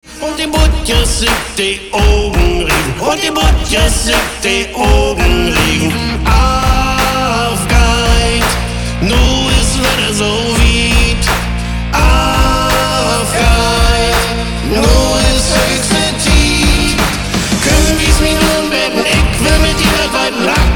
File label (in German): Rock mi plattdeutsch